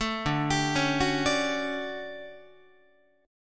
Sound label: D Major 11th D down Guitar 4A 4E 5A 5D 5G sharp 6C sharp; DM11 arpeggiated